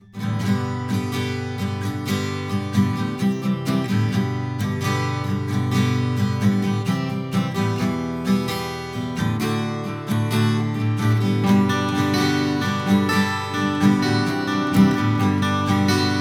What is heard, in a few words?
Acoustic Guitar; Shure SM4